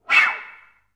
FL FX, Advanced Orchestra

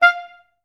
STAC F4, Orchestra; Best Service; Peter Siedlaczek's Advanced Orchestra

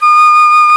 FLT FLUTE-D, Orchestral Winds; Roland LCDP04; 90 s Sample CDs; C Flutes 3-8; C Flt Sh 6&7

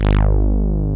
DRY BASS; Masterbits; Dance Trax

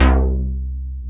big seq C2; Best Service Dream Experience

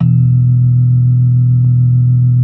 B3 E 3; Best Service; Advanced Media Trax Modern Composer; B3 LES 1

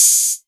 808 Hat O; Novation Drumstation

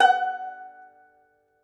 Tenor Banjo; Plucked Strings; E-MU Producer Series Vol. 7 – Old World Instruments

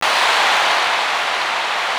APPLAU; East West; Global Sounds; ORGANS

